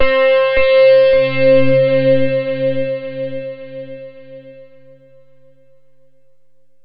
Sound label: guitar notes into distance